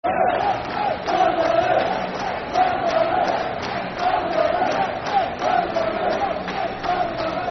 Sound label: Bradford, Barmy Army, Football Chant (Soccer Song); Bradford City Bcfc